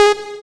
SYN POLY

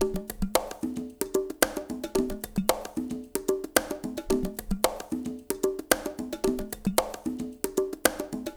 DRY; Spectrasonics; Liquid Grooves 1 & 2

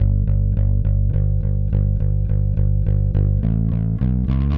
Clinical Bass

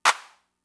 Clap, Fricke MFB-522, Drum Machines

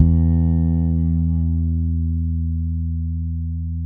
FRET E, PEDULLA BASS; Masterbits CLIMAX 7 - Bass

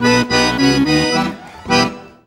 ACCORD HITS; Best Service; World Colours

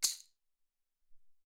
Maraca

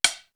CHOPSTIK; East West; Global Sounds; D4 FXS

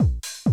130, Classic DrumLoops

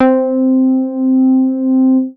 MOOG BASS C4; Best Service Dream Experience

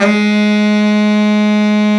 BARITON SAX

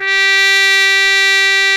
G4 C, BRASS; AKAI S-Series CD-ROM Sound Library VOL-8

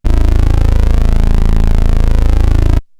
ANALOG, BASS; Best Service; Hyper Dance

